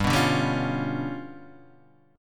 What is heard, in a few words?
G 7th Sharp 11th G; G7#11 strummed